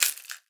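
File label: rattlebone, percussion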